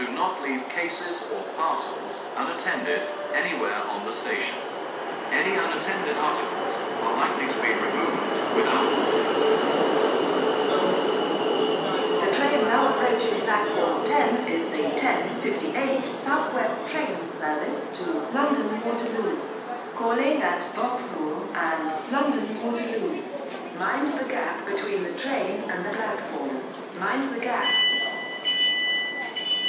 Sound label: clapham junction platform 9; uksm